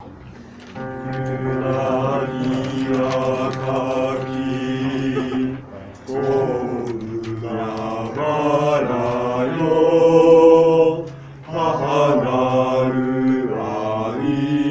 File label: bass; hahanarukuni